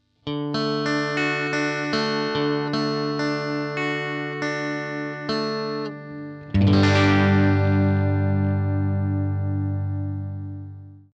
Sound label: Clean; Steinberg UR816C